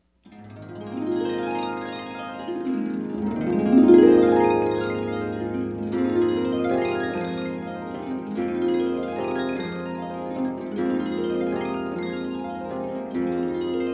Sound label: Harp Lullaby